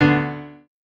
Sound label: piano